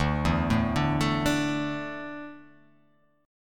D Suspended 2nd D down

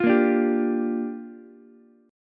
G Minor 7th, Ukulele; Gm7 strummed; JGuitar

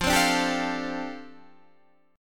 F 6th Add 9th F down Guitar DADGAD, chord

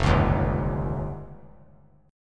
F 7th Suspended 2nd Sharp 5th; F7sus2#5 strummed; 5,8,6,5

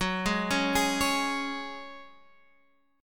F sharp Suspended 2nd, F sharp, guitar chord; arpeggiated

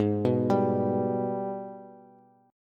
Ab Minor Major 7th, down, Bass; arpeggiated; AbmM7

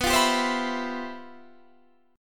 G 13th B, chord

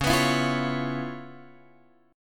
C sharp 7th Sharp 9th C sharp Guitar Open F; strummed; C#7#9; JGuitar

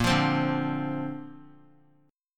A sharp Major Add 9th; strummed

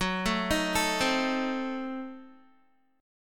F sharp Diminished 7th F sharp Guitar; F#dim7 arpeggiated

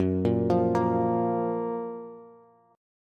F sharp Major 7th, Bass; arpeggiated; JGuitar

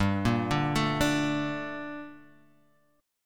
G Minor Add 9th, Guitar; arpeggiated; JGuitar

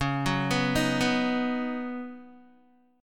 Db 7th Suspended 2nd Suspended 4th, Guitar; Guitar-4F,4A,5C,5G,5C,6E; Db7sus2sus4 arpeggiated

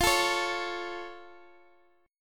F Augmented

